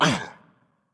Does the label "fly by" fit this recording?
No